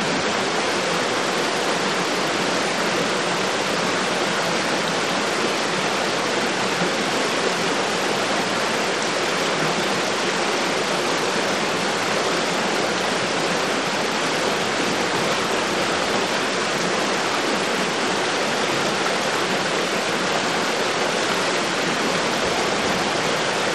water; svencoop

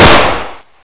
famas fire; firearms

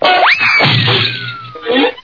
bonk zing

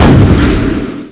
spike; quake1

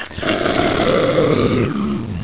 zombie alert

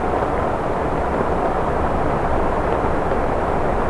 rain inside

